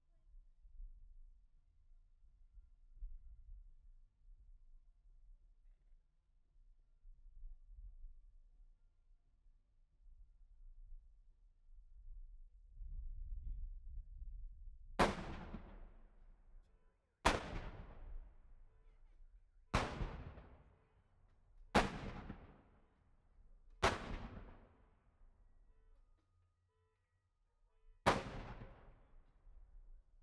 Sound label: Howitzers bformat